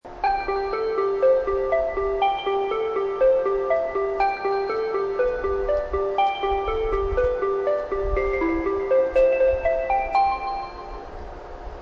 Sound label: melody, station